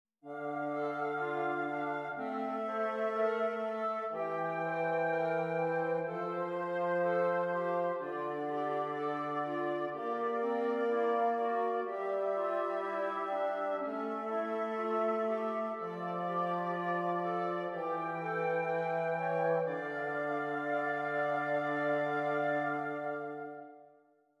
Esercizi di contrappunto a tre voci; dritte Gattung; Ronge pagina 62; corretto

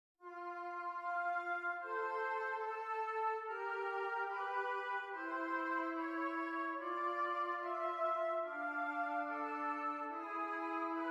esercizi di contrappunto semplice A due voci

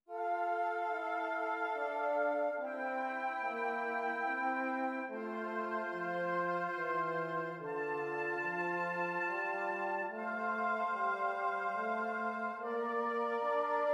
Esercizi di contrappunto a tre voci; Zweite Gattung; Nottebohm pagina 51; corretto

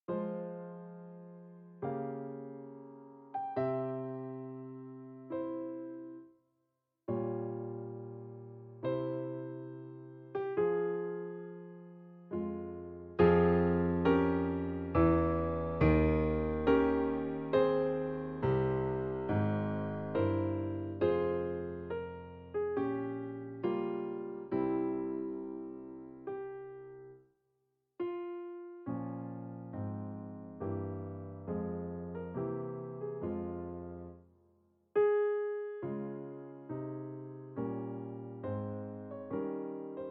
Andante con moto per pianoforte; Berg Conrad

